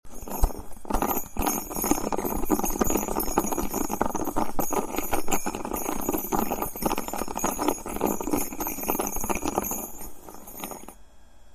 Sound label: bell volleyball